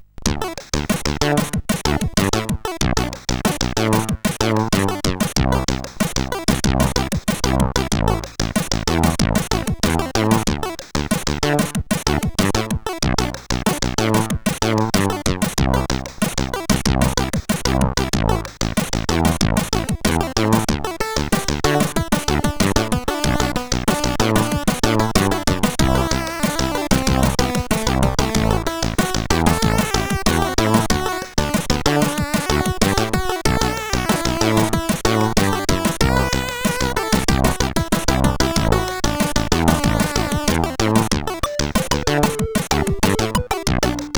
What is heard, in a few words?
Funny, CSG8580R5